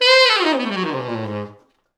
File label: T Sax falls, Horns Sax